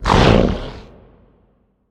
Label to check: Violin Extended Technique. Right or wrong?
Wrong